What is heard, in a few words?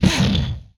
Explosion; Space Blasters2D